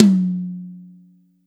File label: TOM; osu